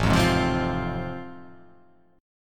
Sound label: A Minor Major 7th Sharp 5th